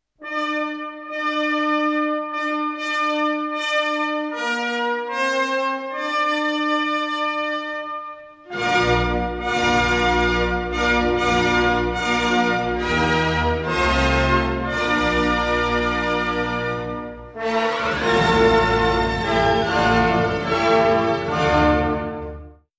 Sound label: Symphony No. 1 first movement; Spring (Schumann); Legend of Galactic Heroes; Galactic Empire SIDE